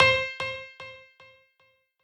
piano sabi

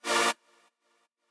chord

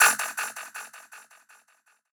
bass3

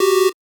Chord

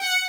violin

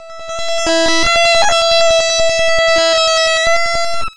lead E5 streched

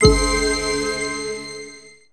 RESULT METER FULL; maimai

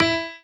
piano melo

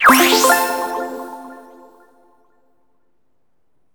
MAP NEW OPEN WINDOW; maimai

